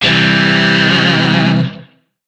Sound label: Shawzin Phrygian Chord; Music; DE; Warframe; Lizzie Shawzin